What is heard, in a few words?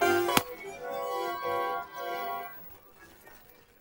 Dramatic Sting; pingu the original soundtrack